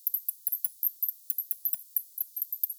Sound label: Nyctalus leisleri; europe